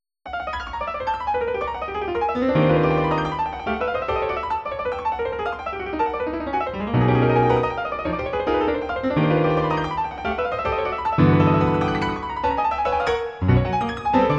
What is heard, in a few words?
etude piano